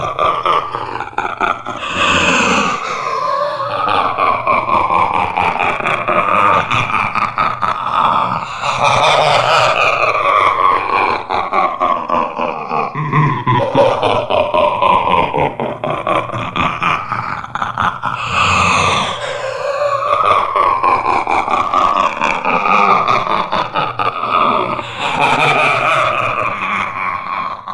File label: satans laughling